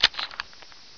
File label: glock Silencer Off